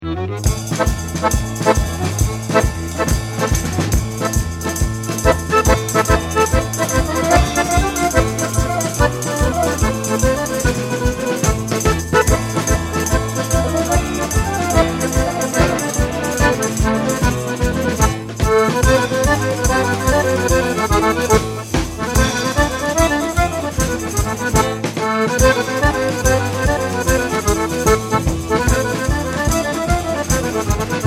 Italian Accordionist Tarantella Napolitana

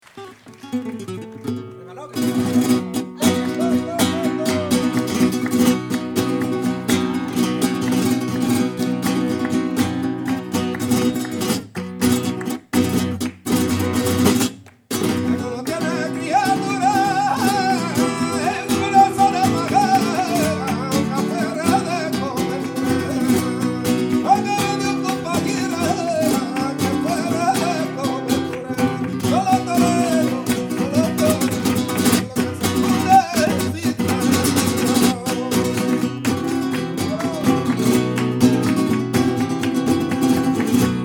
Flamenco Group, Buleria; Montreal

